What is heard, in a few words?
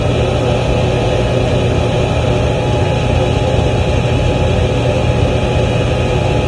turbine